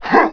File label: jump; PC Gamer IT CD 41; Daikatana; superfly